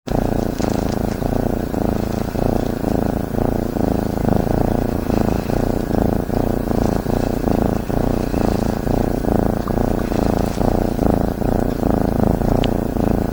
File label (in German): schnurr